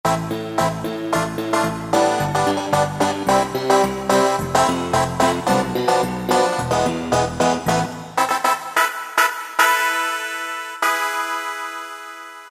Tine Wave; Factory preset demos

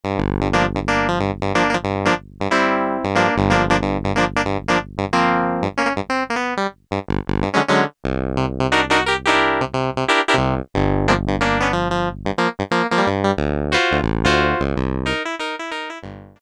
CLAVINET, Kawai SX-240

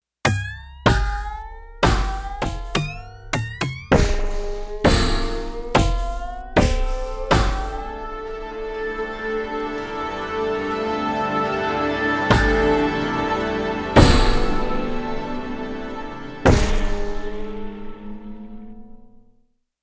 FX Menu

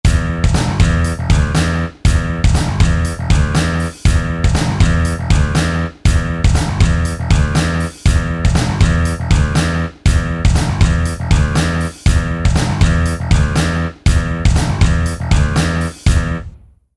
Metal

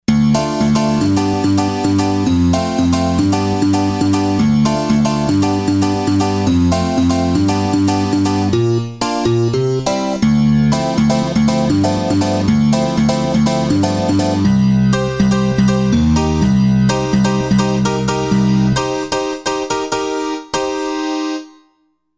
House Piano

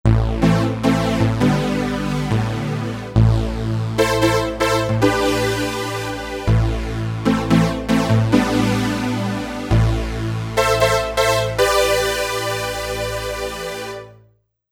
Voltage Pad

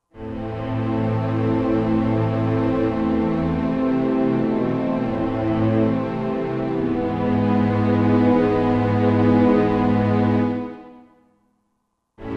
Film Orchst, Roland SR-JV80-16 Orchestral II

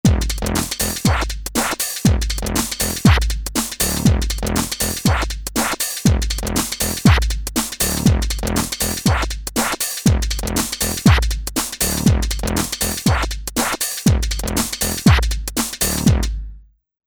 House

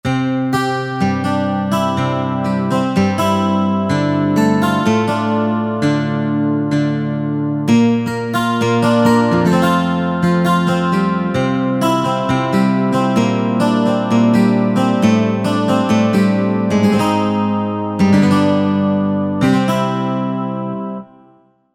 12String

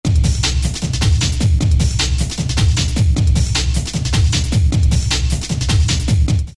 Darkman 154; Roland SR-JV80-06

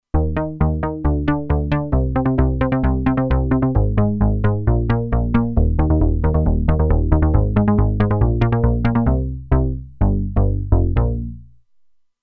Seq Bass, Roland Alpha Juno-2; Factory 2

